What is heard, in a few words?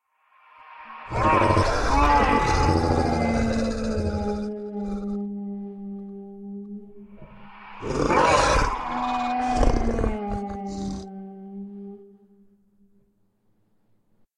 Hounds Roar; Hounds of Kintargo; SyrinScape SoundsScape Collection; bbh Haunting part 1; TTRPG